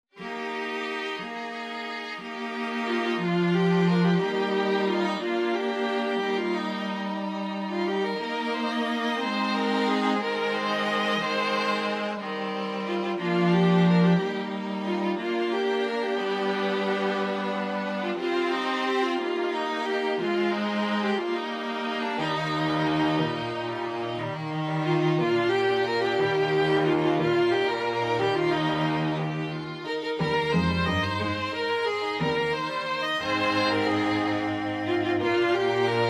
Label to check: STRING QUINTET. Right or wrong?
Right